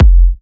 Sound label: F, kicks; markus hakala kicks